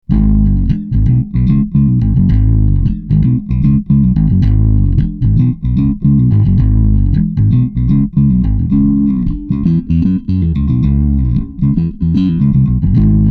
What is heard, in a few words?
riff